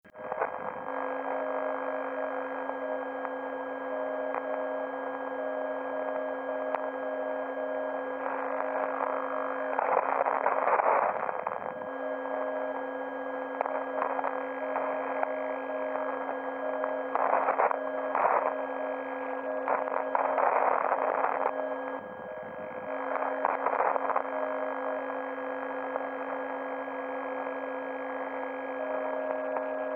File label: 136320Hz